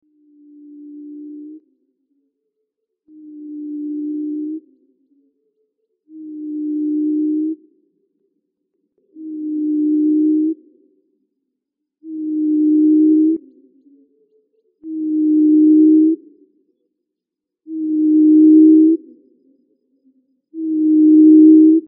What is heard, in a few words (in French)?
turnix mugissant, chant